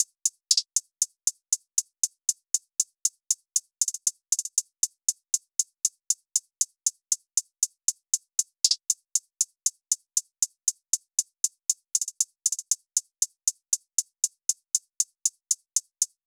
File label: Drum Loop, 118 BPM Hihat; Loop Stems; Cymatics; Vibes II Drum Loop Collection; How So Drum Loop